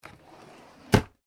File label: household drawer wooden open; Wooden Drawer Opening; Drawers; McKinney Sound